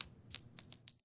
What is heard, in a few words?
9mm shell drop; Titanfall 2